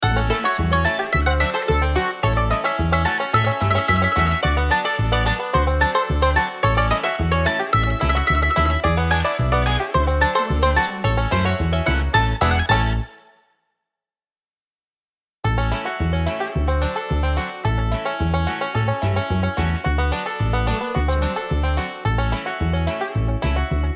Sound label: summertime bluegrass logo